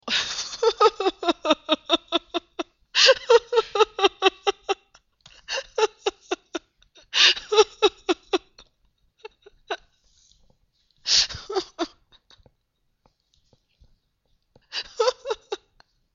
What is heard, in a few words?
laughter